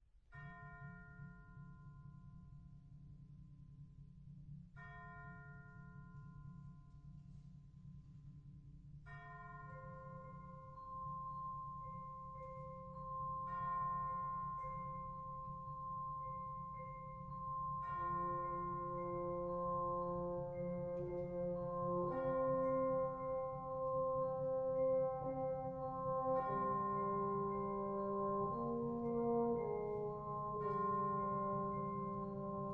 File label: Chorus